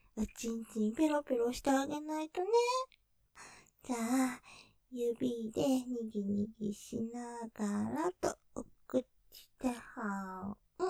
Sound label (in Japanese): 楽しそうに・おちんちんぺろぺろ; ステレオ; 導入